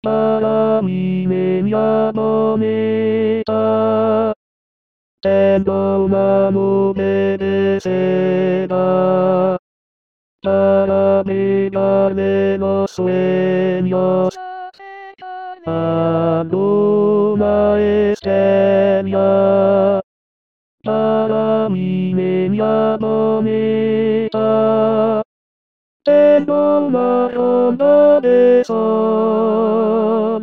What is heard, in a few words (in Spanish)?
TENORES VOZ